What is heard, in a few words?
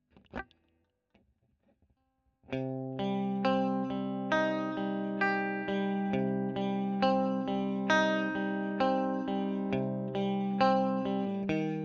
E.GTR Royer